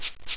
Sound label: Shaker